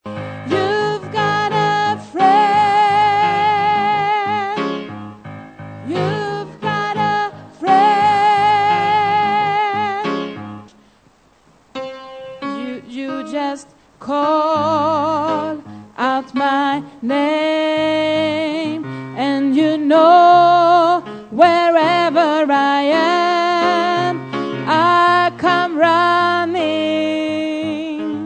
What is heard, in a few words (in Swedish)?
bas, stammor